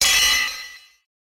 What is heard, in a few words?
robot step